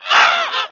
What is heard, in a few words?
mount; sfgame; mounts